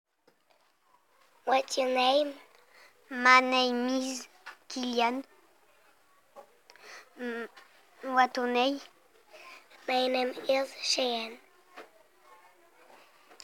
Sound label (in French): vocal